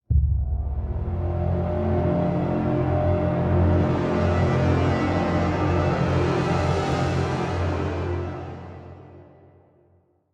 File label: Play Stinger; Cthun Shattered